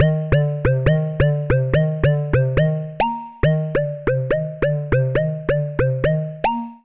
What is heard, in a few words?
Lead C Minor 140 bpm; MIDI Clips; Ableton; Factory Packs; Skitter and Step; Off Track